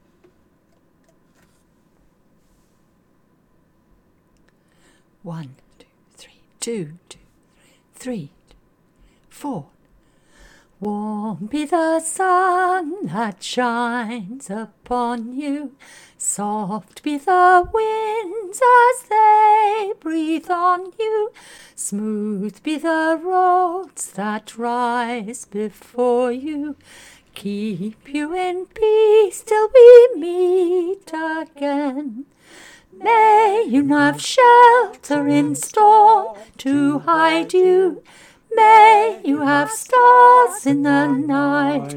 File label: alto